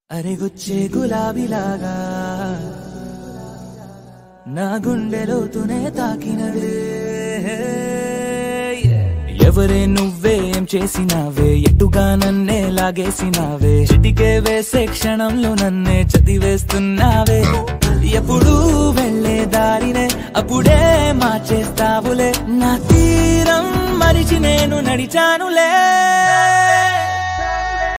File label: telugu love songs